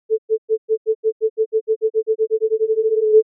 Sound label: grain lengthening, Lengthening grains to control temporal cohesion; Spectralism and Microsound